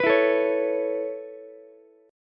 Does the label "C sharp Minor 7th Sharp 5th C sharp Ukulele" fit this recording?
Yes